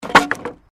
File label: Wood Drop; Material components for constructing more complex sounds; Sound Effects:Materials